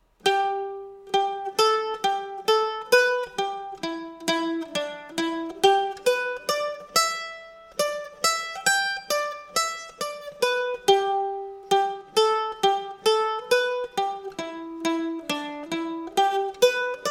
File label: slow, banjo